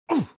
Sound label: dang; Rob Runner